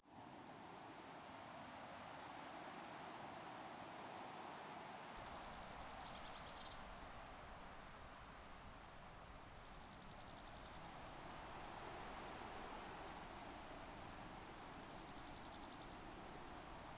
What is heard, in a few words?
ambiance; seeding-planets